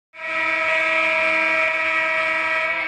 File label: Buzzer